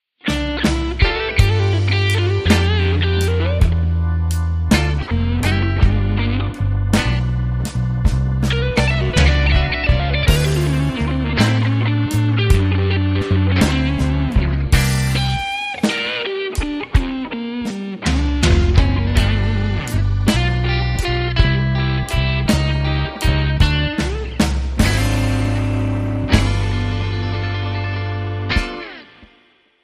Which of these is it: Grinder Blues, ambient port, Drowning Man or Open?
Grinder Blues